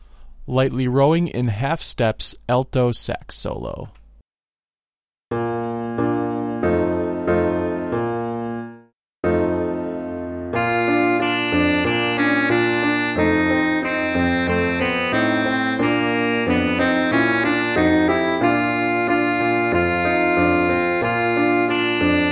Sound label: Lightly Rowing in Half Steps Alto Sax Solo